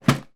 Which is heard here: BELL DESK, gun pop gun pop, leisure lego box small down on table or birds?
leisure lego box small down on table